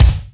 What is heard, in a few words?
PRO BD; Big Fish Audio; Synth City